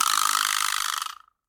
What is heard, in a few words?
Vibraslap; Latin Percussion; Drum Hits; Wood; Ableton; Factory Packs